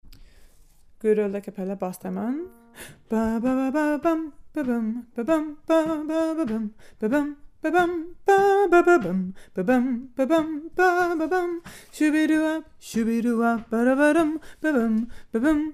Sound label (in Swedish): Bas-gång; Good old a capella (bas)